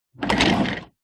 household radiator portable wheels roll very short wood floor